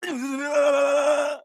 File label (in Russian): Удар током; Фраза; Лусио